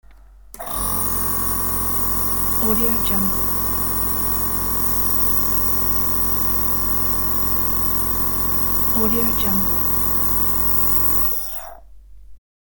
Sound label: inhaler